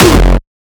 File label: kick oneshot monster F; GhostHack